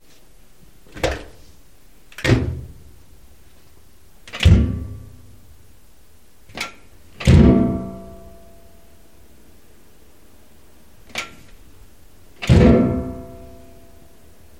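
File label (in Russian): звук открытия закрытия стиральной машины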